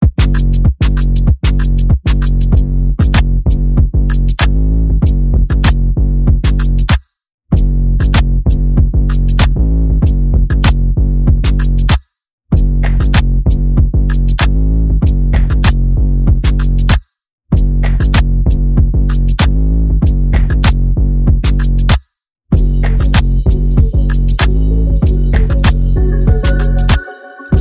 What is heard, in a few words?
No Vocals